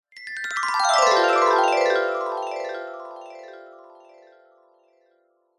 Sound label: fantasy magic glissando bright mallet style magical burst descending